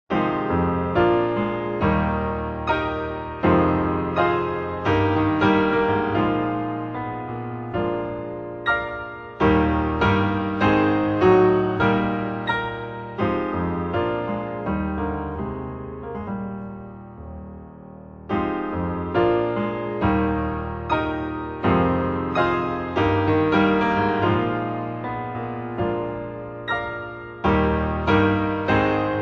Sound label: Keyboard